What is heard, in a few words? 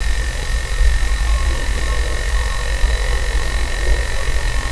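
silo corridor hum loop, ambience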